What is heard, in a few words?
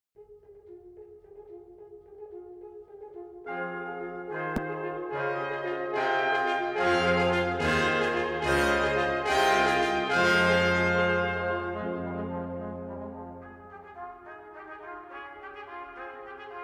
Carol Of the Bells Classical Version, Brass